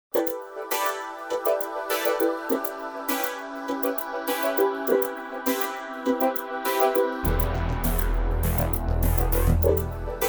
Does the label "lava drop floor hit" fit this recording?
No